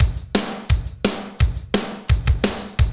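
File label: tek rock, Roland drum beats; Northstar - Drumscapes Roland; Fast Tekno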